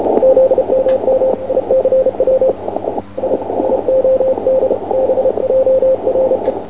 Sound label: cw